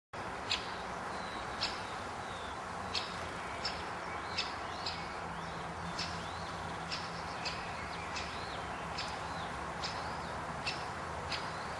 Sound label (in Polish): dzieciol duzy; zakole wawerskie